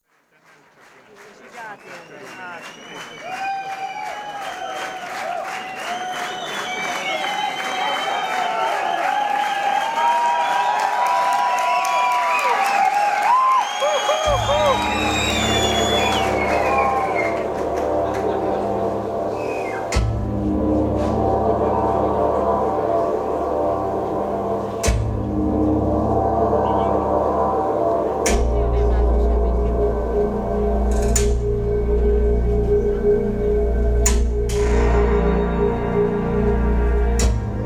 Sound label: Percussion Solo; Budapest; Zabrze